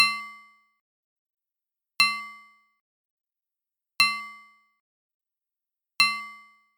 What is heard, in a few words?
Semibreve, harp